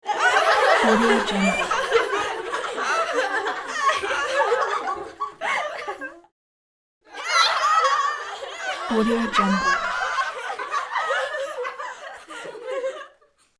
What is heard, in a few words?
teenage girls laughing maliciously